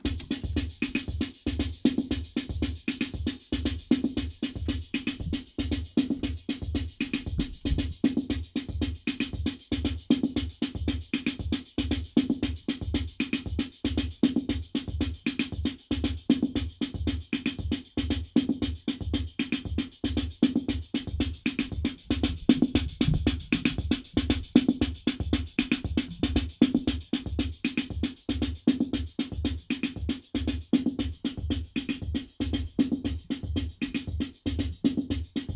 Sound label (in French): samba